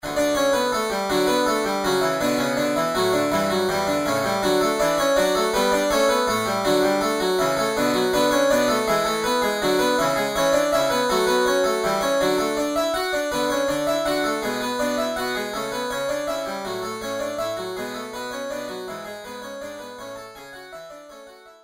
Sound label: Harpsichord